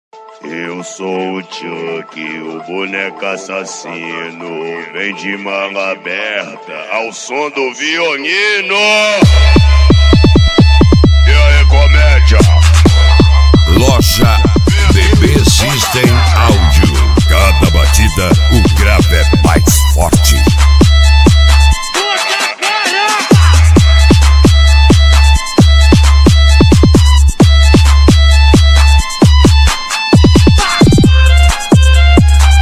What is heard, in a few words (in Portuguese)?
ao som do violino